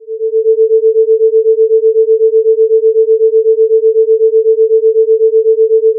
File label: sine 440 L 448 R